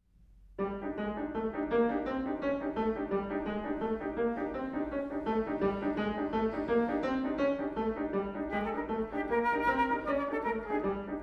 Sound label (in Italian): Musica per flauto e piano; Kompositionprobe